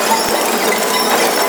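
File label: A#4 squawk; WAX SPROCKETS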